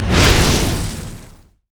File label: flame breath dragon; LoL; shyvana